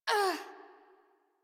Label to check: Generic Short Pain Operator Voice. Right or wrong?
Right